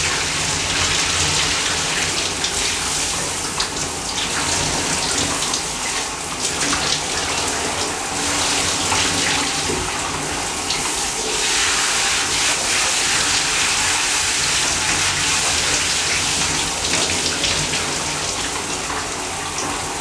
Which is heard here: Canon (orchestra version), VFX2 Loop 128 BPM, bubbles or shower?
shower